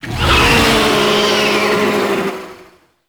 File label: Pre Mercy Stinger Alt; Dactolyst; Warframe; Tau; BoroOverlook